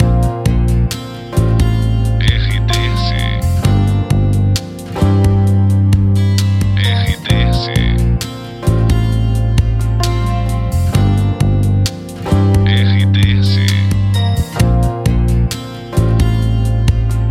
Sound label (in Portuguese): trilha para locução adulto; Web Net